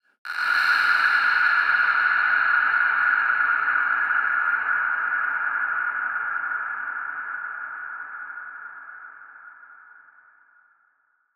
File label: Exhale; Antidote Advent; Infected Track Drums Ableton Project